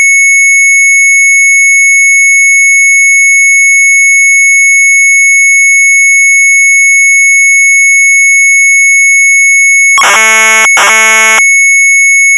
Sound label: MZ800 Tape; sharp; PELMANISM; retromelsarchive